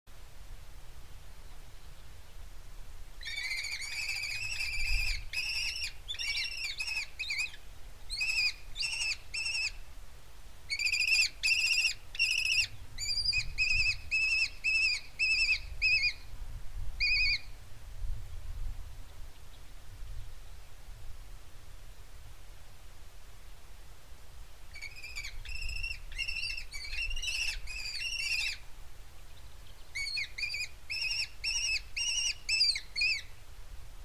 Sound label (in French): faucon crecerellette